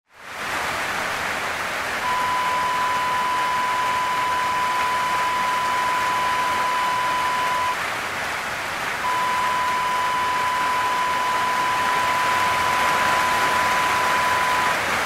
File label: rain on tin roof